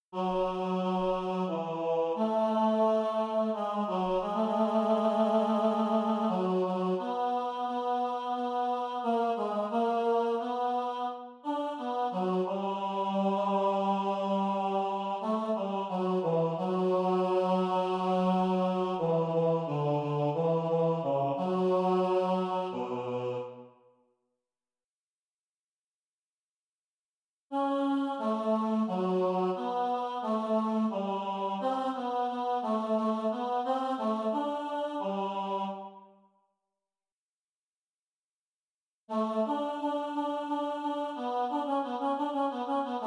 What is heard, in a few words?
bass alone at tempo, choir; bach j s nun komm der heiden heiland bwv 621